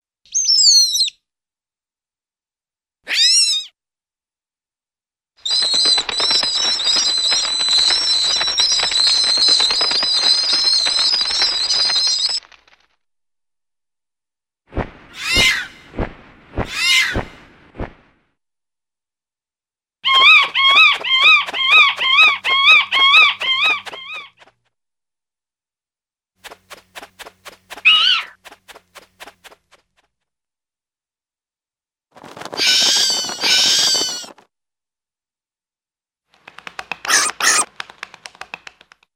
Various High-pitched Overlapping Squeals and Wings, Cartoon; Hollywood Edge; Cartoon Trax